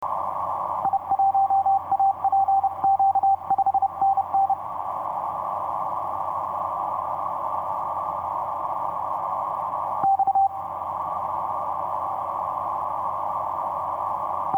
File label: 15m cw; xw1b; i1apq; Asia